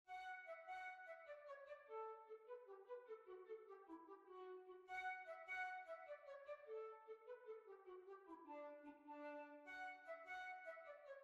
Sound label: Kings Bridge Assembly